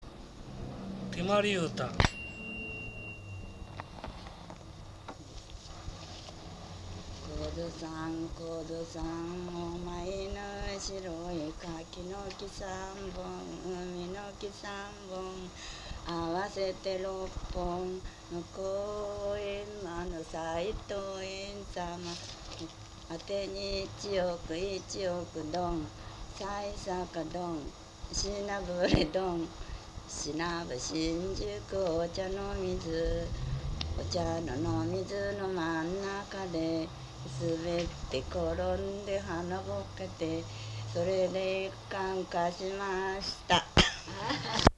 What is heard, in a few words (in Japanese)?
temariuta, minyou